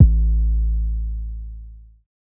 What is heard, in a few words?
808's